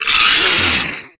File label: Attack